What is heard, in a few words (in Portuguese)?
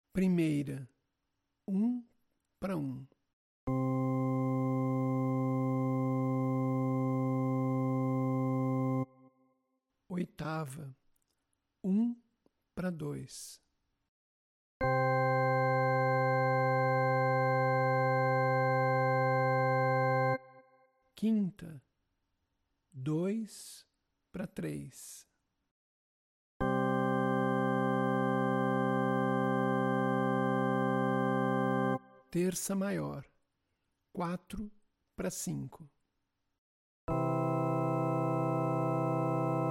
intervalos